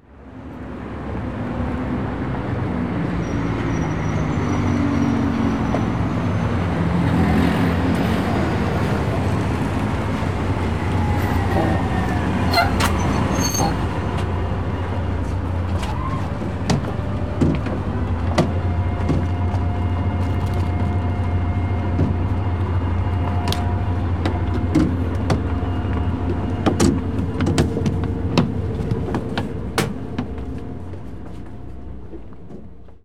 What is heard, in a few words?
bus arrives, street traffic; best service Blue Box